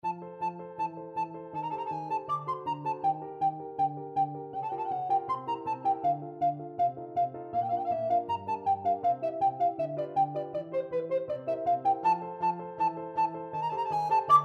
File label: carnival finale, alto recorder